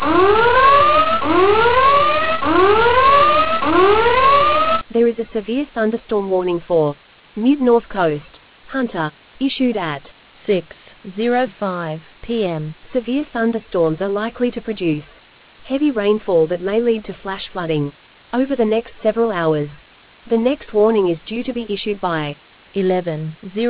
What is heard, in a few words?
storm audio